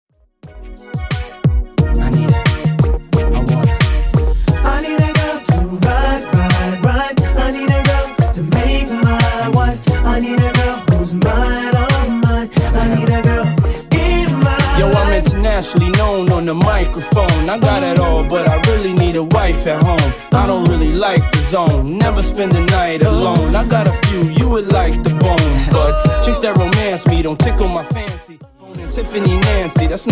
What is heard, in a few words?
Throwback Rnb 89bpm